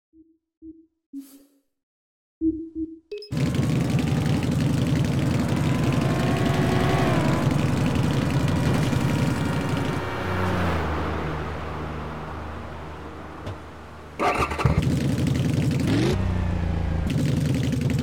ducati monster s4r

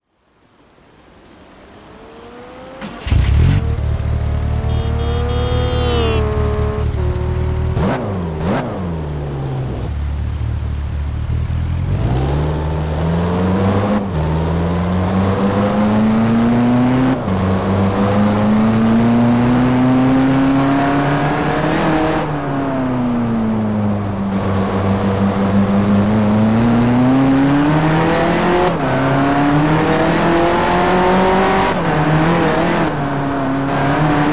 bmw 2002 turbo; BMW 1M

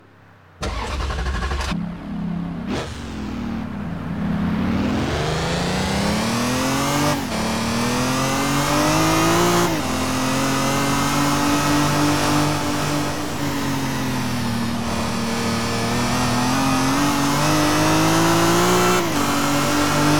ferrrari f360 challenge stradale; TDU-1

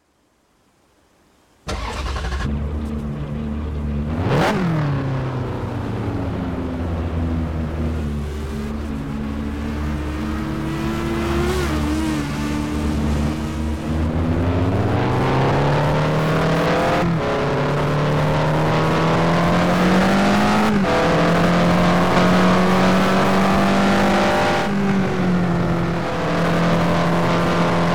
ferrari 360

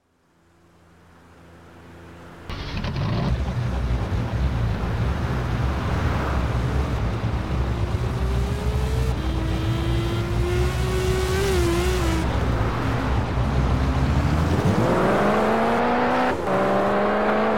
ferrari f40

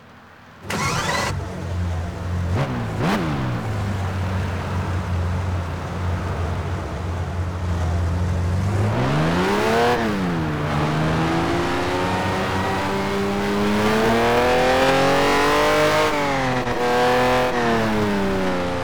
lamborghini aventador lp700-4

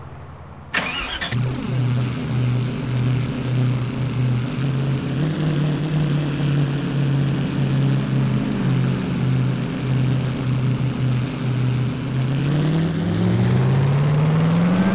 chevrolet camaro zls 1000

